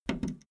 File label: video tape player plastic fast forward click slow